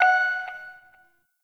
hack minigame touch node; Warframe; Duviri

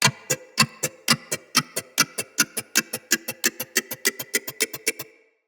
Collector Bomb Plant Timer

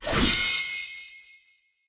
Short Sword Throw Perfect; Weapons; Player; Swords; Sollos

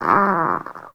Kitten Snore; Enemies; Warframe; Catbrow

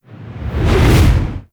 Fall Whoosh; Foley; Warframe; Characters; Tenno

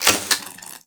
Mech; Weapons; Warframe; TheNewWar; Omicrus

